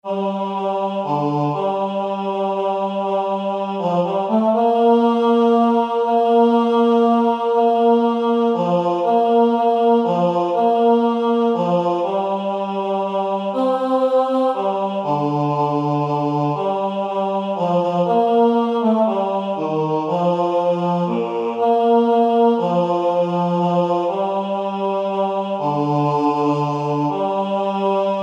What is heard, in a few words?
Bass; Cantate Domino